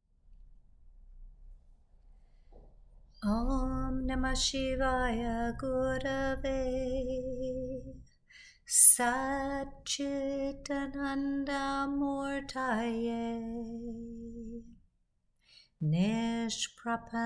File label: Chant to Shiva